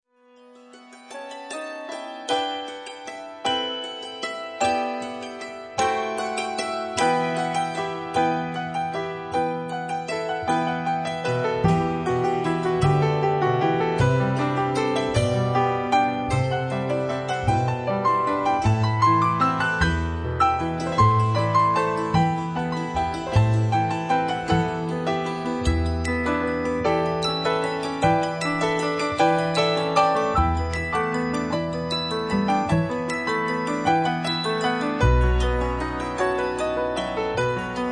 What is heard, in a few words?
carol bells